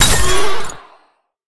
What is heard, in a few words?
Spider Kick; Game rip; MiSide